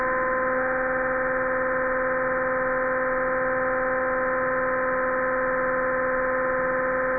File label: machine turn; Five Nights at Freddy's; FNAF 2